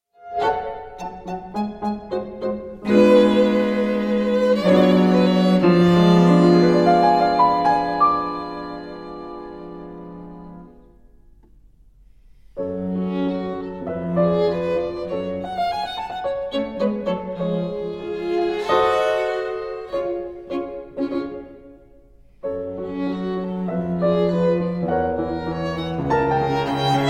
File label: trio for keyboard and str in f sharp minor 1st mvt allegro